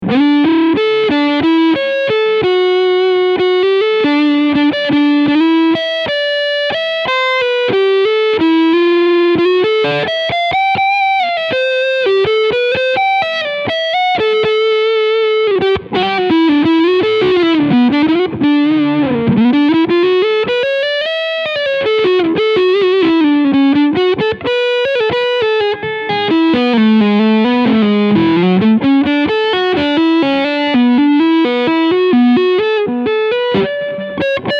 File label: Gretsch G2420T Cleansound Overdrive Front Pickup